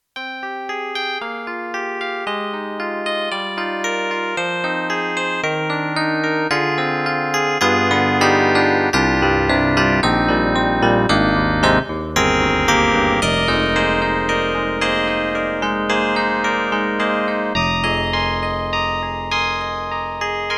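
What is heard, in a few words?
YAMAHA MU1000EX Reso DX, piano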